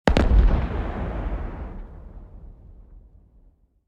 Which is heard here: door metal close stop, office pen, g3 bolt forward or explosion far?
explosion far